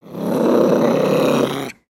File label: Pet Cat Angry; creatures pets; Lego